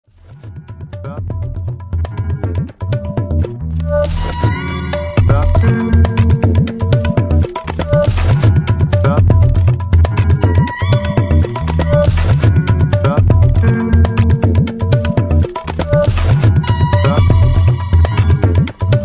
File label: Sitar; TakaSitar